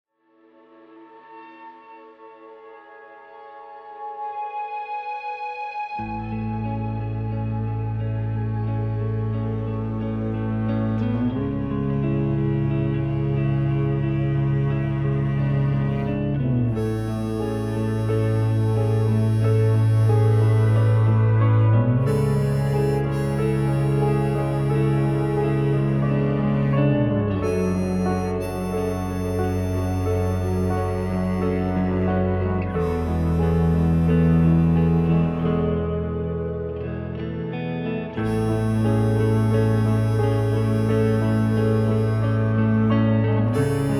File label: cello song